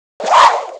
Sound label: ATTACK